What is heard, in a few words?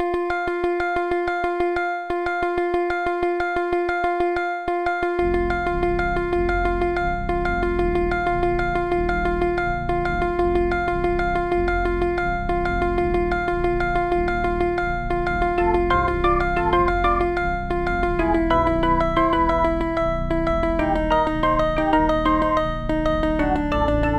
bossa; The Music Studio; MIDI